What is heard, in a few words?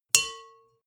bowl hit soft